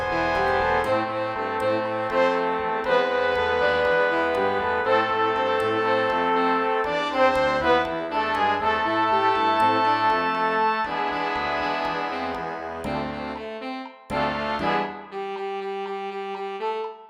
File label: latin sketches